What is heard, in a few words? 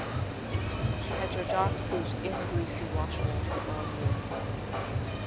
mix Cafe